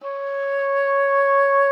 C#5, OB D.AMORE; Xsample VOL-7

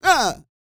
GRUNT, MALE HUMAN; ILIO; Vocal Planet

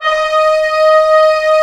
ORCH D#3 PP, Orchestra; Sound On Sound; Peter Siedleczek's Orchestra

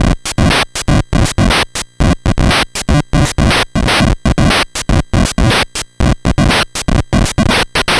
NES Noise Drum Loop; Nintendo Entertainment System; Drums; Chiptune; Sonic Foundry (Sony Creative Software)

